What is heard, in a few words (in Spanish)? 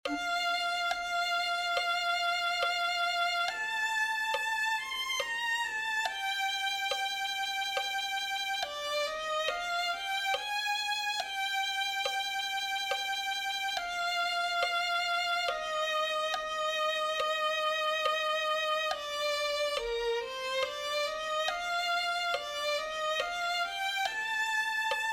se tu nuestro dios violin